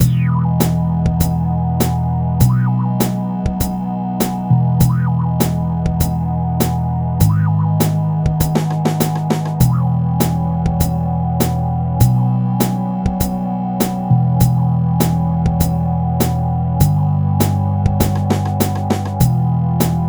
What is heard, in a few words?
seven modes